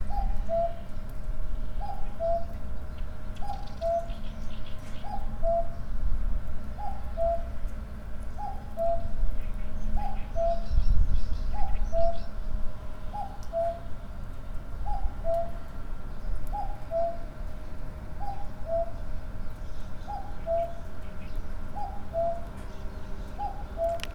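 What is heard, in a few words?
bird, Common cuckoo; kakkou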